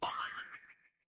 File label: cartoon bonk